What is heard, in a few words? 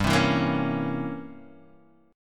C 7th Flat 9th G